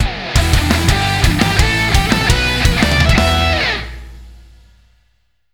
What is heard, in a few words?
Jingle Win